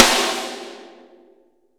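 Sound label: SN, snare; Best Service; Real Mega Drums VOL-1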